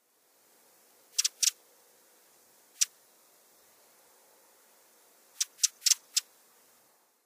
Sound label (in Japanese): wakiaka, makurazaki